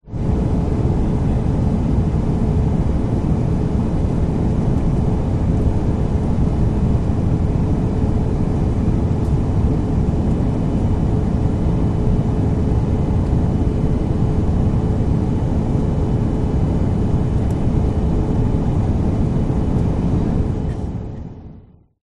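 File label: Plane Cabin Runway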